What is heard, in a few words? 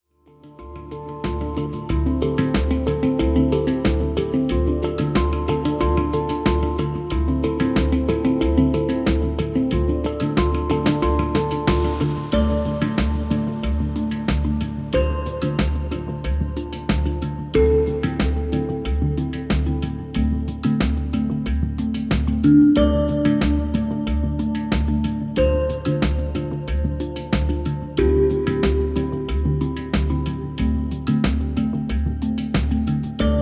Hang